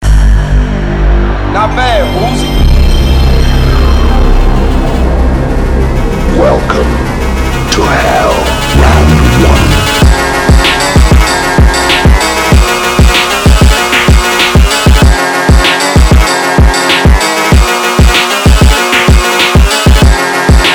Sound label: Phonk